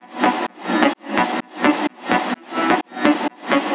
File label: synth 128 Bm; Electro; Anthemic; synth loops